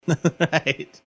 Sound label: chuckling saying right